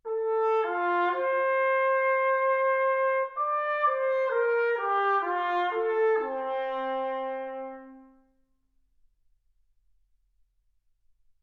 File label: trumpet